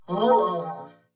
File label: confused; baba yaga god metalhead; Smite